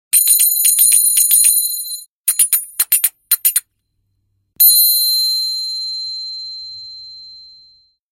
Zills Turkish Delight; Saroyan

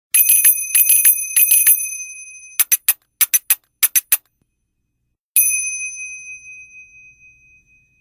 Zills Contemporary Light; Saroyan

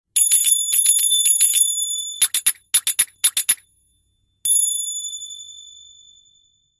Zills Arabesque Silver; Saroyan

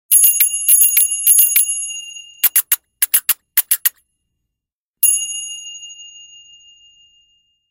Zills Contemporary Heavy; Saroyan

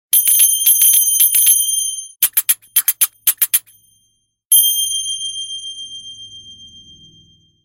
Zills Arabesque Brass; Saroyan

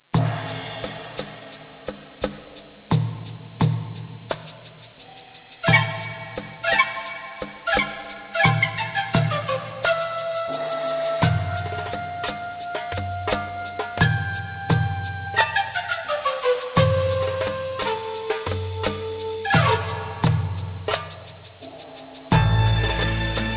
Pharonic Sample, belly dance

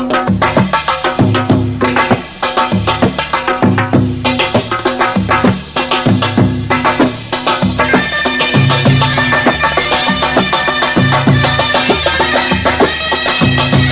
Mizmar Sample, belly dance

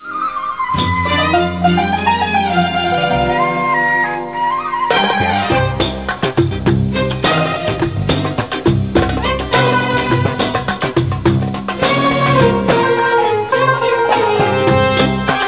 Irkosily Veil Sample, belly dance

